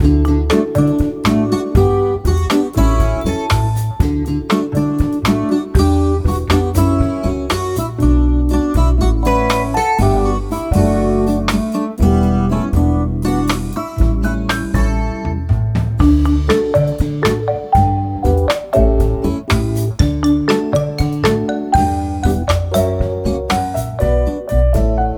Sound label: Training Arrange (Calm)